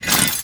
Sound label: sand axe